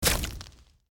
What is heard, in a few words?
Death Burst Small